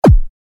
synth zap

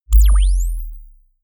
bass drop sizzle

